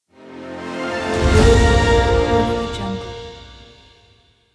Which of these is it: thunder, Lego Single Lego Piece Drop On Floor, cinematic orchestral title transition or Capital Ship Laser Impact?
cinematic orchestral title transition